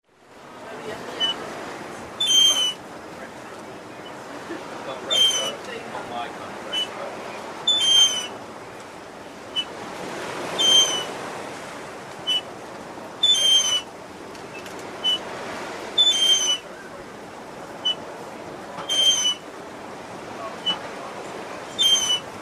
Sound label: under the pier creaking sound; soundmark; under the pier show